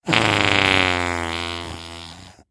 fart; hl2dm

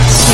teleport; superheros; invisible woman

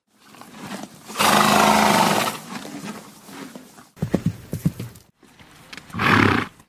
horse

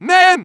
jump; freak fortress 2; meemswarm; medic

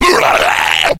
pain, slender shiver

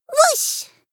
woosh; hat kid; a hat in time

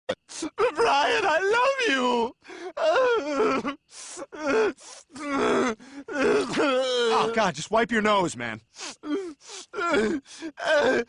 crying; stewie

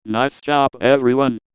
positive vocalization; John Madden

